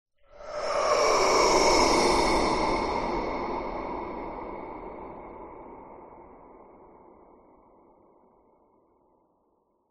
horror breath reverb ghost

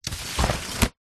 household umbrella auto open

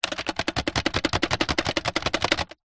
household cassette vhs shake

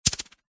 warfare knife throwing weapon spin fast air

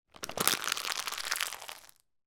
food crisp potato chip packet screw up in hand; zapsplat

